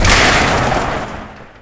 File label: grates open; Tony Hawk's Project 8; XBOX